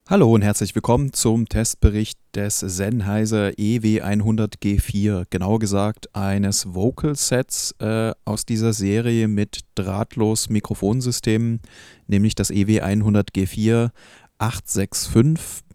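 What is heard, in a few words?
vocal male; sennheiser ew 100 g4-865-s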